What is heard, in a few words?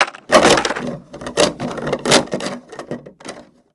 stumble; bucky